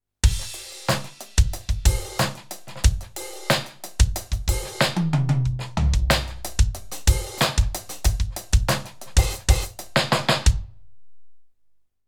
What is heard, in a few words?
Roland TD07 Hip Hop